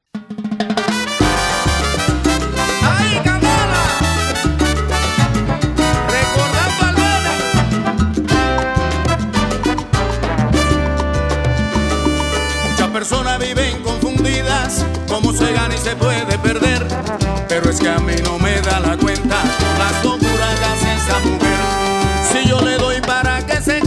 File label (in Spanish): Salsa